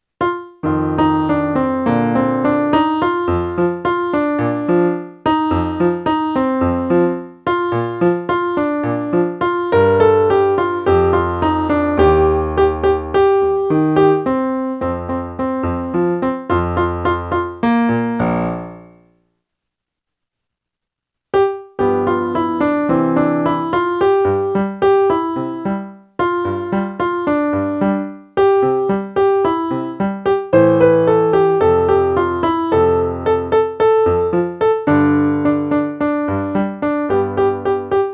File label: Melody